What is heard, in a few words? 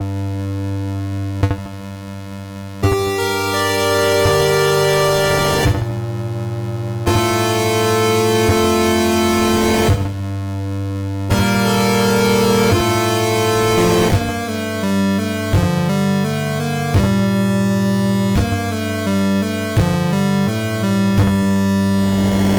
8 Bit Horror; timothy and the mysterious forest ps4 switch windows gamerip 2019